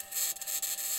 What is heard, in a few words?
mic, mars2020 edlcam